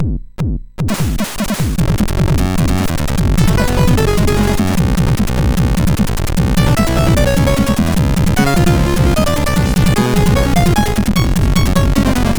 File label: Incidental sid MOS6581R4; Street Fighter II; hvsc